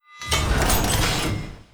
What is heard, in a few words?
free factories alert; Hearts of Iron IV Death or Dishonor